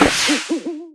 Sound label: Pigeon Release; Worms3D